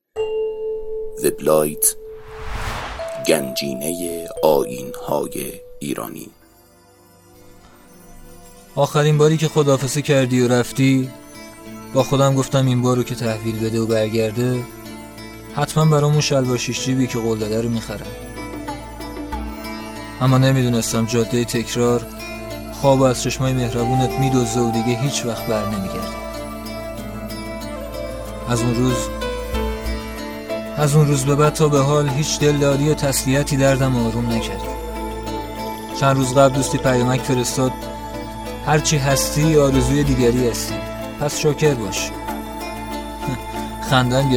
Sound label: shaker bash